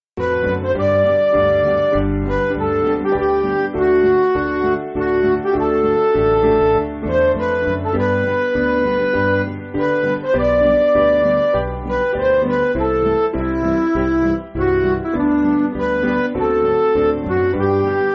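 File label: Music Backing Files for Churches; Cling Hard; Sankey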